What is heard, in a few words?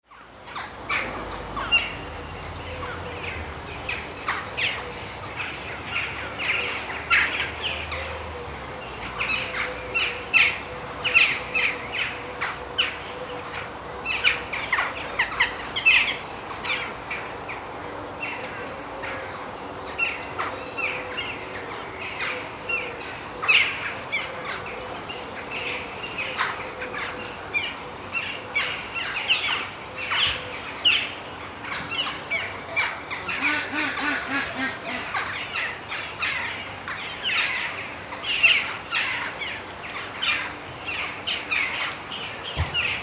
whitchurch jackdaws